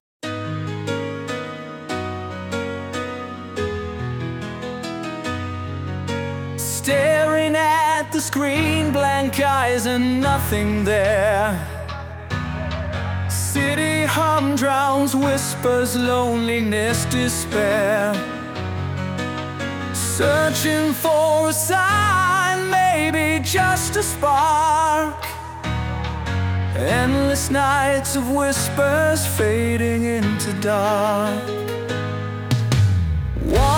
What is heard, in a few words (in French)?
chant